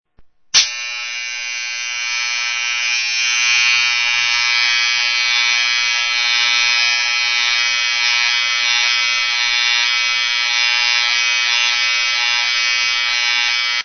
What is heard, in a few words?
SHAVER